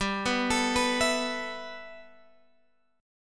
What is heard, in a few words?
G 6th Add 9th, Guitar, Open G